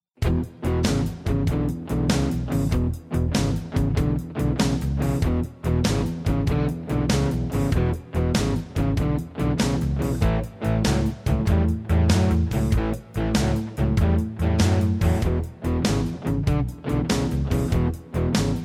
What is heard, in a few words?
Blue Shuffle; CPP Belwin